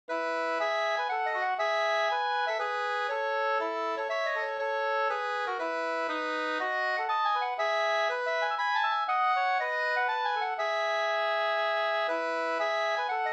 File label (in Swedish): polska brudpolska; Ulfhilds brudpolska av Lennart Bergstrom